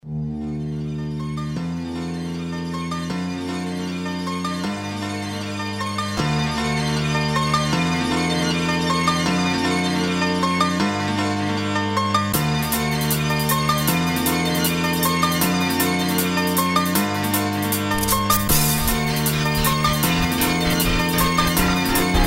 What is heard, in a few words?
Analogue Music